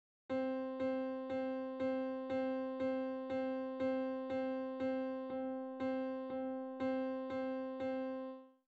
Eighth Notes in Adagio 60

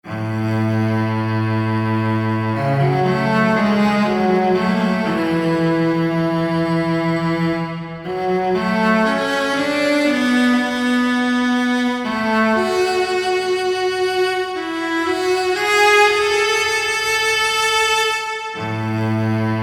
Solo Cello

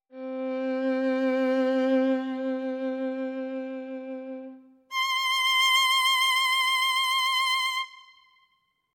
C Up Two Octaves

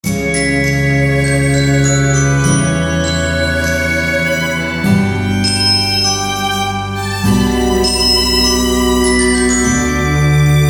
Moderato in D Major

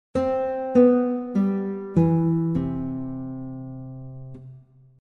Decrescendo Guitar

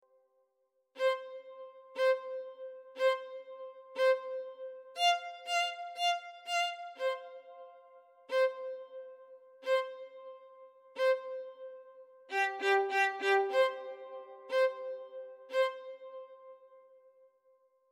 Tempo Variable

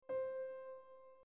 Dynamics p

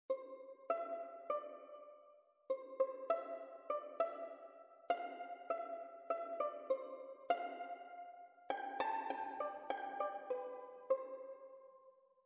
Violin Articulations pizzicato

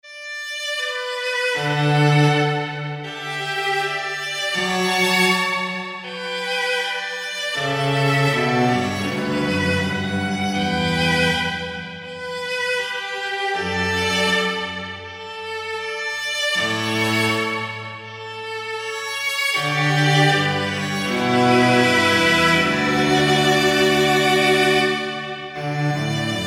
Cello and Viola

